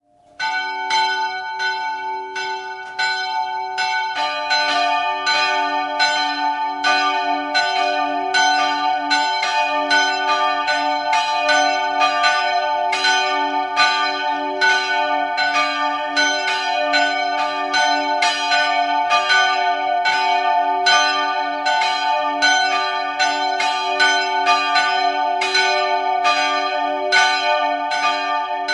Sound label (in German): Hofen, Glocken, Ev. Willibaldskirche; Evangelische Willibaldskirche; die größere ist ein Werk von Karl Czudnochowsky (Erding) aus dem Jahr 1950.